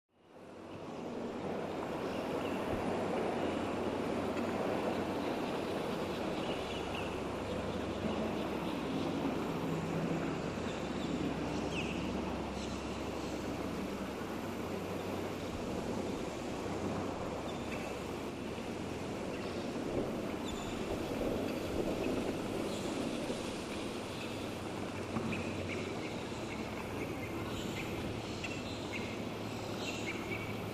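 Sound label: Small park, cars can be heard; Звуки города в парке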